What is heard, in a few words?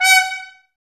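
Brass trumpets F#, Mute; Miroslav Vitous - Woodwind Brass Ensemble; Tps Mute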